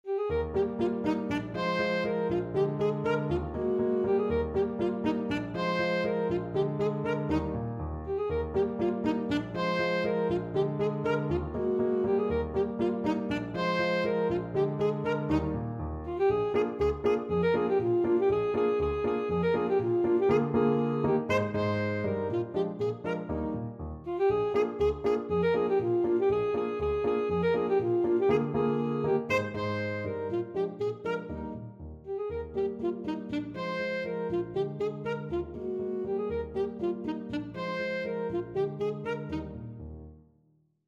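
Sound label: ecossaise alto saxophone; beethoven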